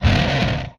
Explosion, retro arcade; MMRetroArcadeSoundsPack

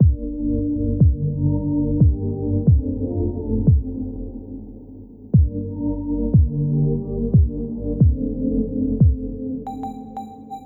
Distant Loop

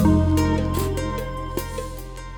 Layers, Pads

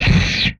Swipe

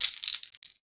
glass falling

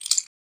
keys, wood metal; wood metal SFX

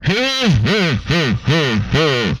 Laugh, Vocal; retro arcade

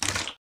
slime; water splash slime sfx

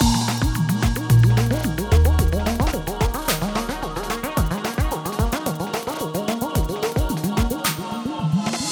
Tension; Loops; BB 2HTC Samples Vol 4 Addendum

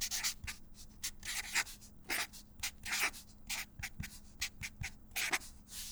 Thicc Tip Writing, Books, Paper, Writing; bb - Books, Paper, Writing

